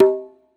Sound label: reels stop; Beauty Fruity; wazdan